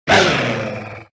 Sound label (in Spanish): Grito de Lycanroc forma diurna